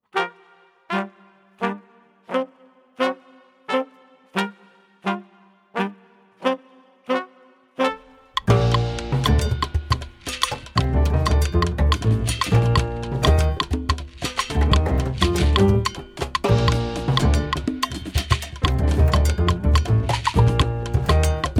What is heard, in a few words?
Rumba